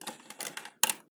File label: tape recorder closing